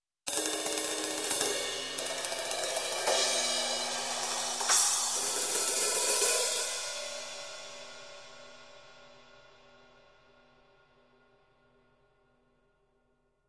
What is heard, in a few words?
Cymbals tag, Music, Percussion; Sound Ideas; Series 2000